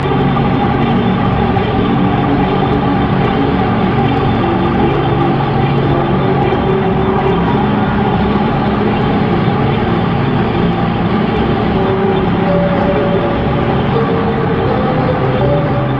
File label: ambient, cave